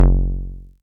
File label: bass stabs, Drum Machines FutureRetro FR777